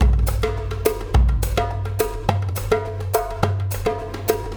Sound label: Tactile Impulse, Processed Drumkits